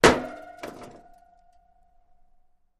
Metal Impacts, Light; Light Dull Impact. - Construction; pipes